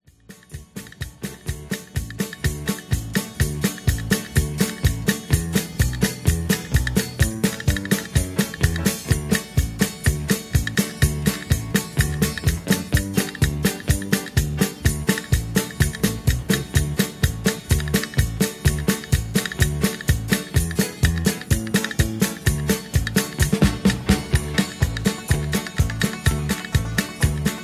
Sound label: Hacksaw Hoedown Patter